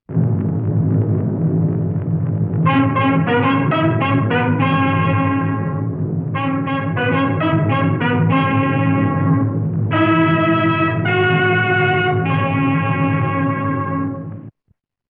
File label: Emblem Music